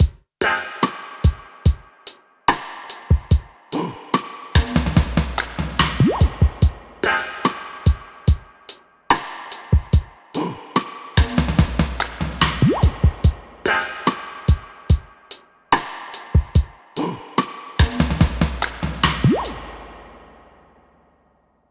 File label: test springs; SURFY